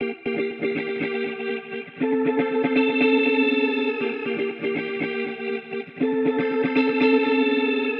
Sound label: 120 C F Dancey, Spaced Out Guitars; Loops; Touch Loops; CosmicFunk